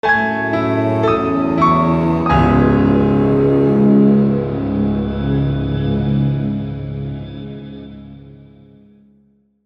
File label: mystic logo